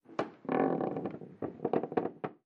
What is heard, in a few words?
Creaks Wooden Stairs